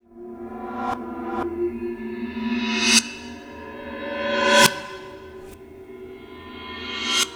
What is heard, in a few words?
Waterphone Reverse Hit; Sonic Foundry (Sony Creative Software); The Electronic Music Manuscript Collection